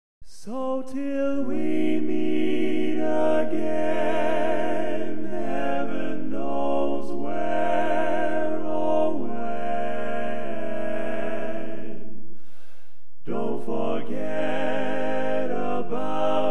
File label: Lead, Classic Tags